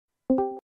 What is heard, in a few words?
joining voice chat sound effect; discord